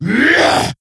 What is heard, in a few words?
attack, creature; Shadron